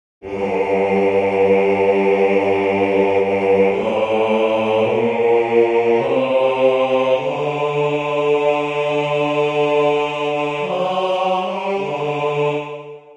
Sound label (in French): Basse, chorale; Pie Jesu